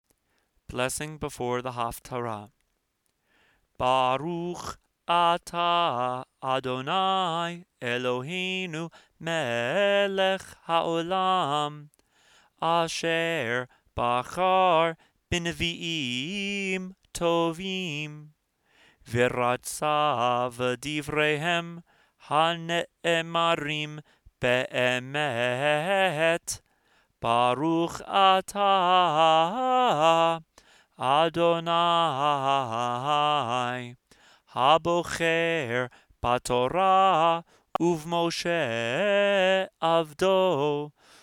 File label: Blessing before Haftarah